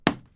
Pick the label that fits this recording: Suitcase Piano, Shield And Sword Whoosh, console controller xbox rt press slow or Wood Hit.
Wood Hit